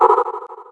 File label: telekinesis